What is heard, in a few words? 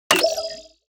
button active